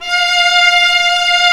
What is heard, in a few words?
STR, Vlas Sect, Agitato